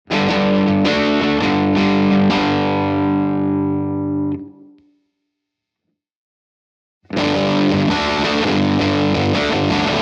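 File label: Slightly dark, gainy 90’s alternative rock tone that retains clarity, note definition and and amp-like response.; A Dash / of Filth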